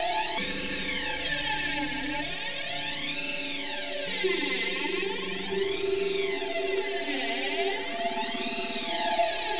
Oort Cloud, Tonal Atmospheres; Sonic Foundry (Sony Creative Software); Myths of Technology

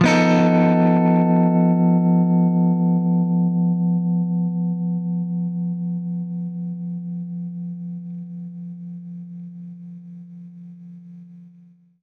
Baritone Chord F7b5, Guitar Chords; undercover samples

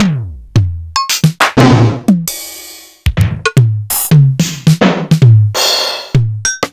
Emu Drumulator

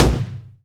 Percussions

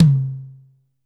C3 Mid Tom H, Yamaha PSR-E323 Standard Kit

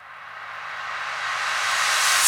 Rise and Falls; Retrowave

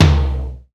XE8 tom 1; Hollywood Edge; The Big Bad Beatmachine

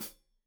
Hat Foot, Hi-Hat, Cymbals Stereo

